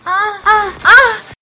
lewd moans

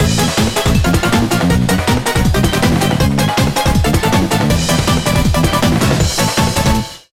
rush fever music loop

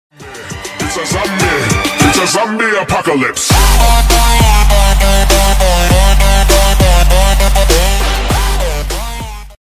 twitch alert sounds donation follower sub sounds

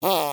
airy fart

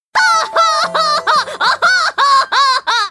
laugh; yoshiko

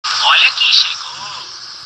wave

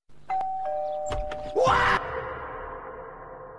doorbell; carl wheezer

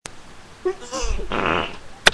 strain fart

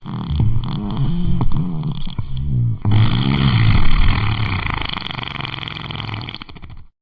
funny fart bean fart; funny fart sound effect

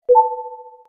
wii home button sound effects

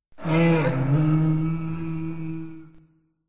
pain grunt

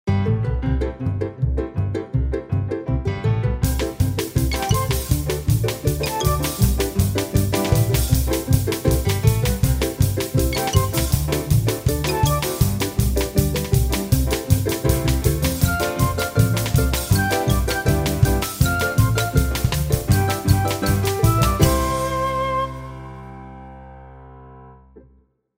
Peppy Song Intrumental

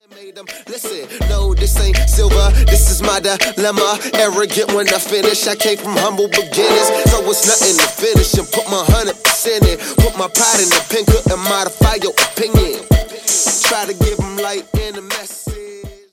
chain music

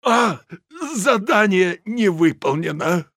death

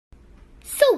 SUHWOOOO, kid; vine

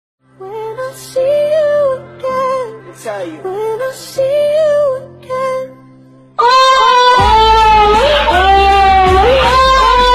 moan

